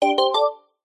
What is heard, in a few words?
multimedia game sound positive warm bright bell mallet tone